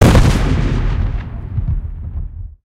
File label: aa explosion; War Thunder